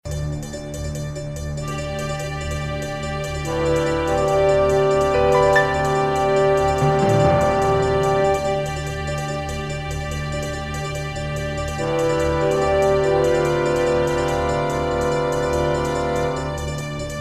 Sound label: High Drama, orchestral